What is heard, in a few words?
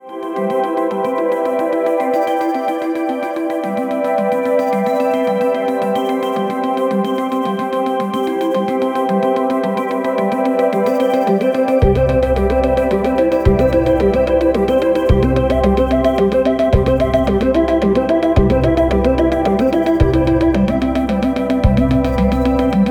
pad